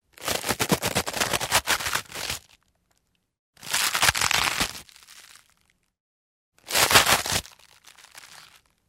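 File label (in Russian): ломают пучок салата разбирают на листья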